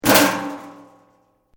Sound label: METAL BOX; Metal (Sound Effects:Materials)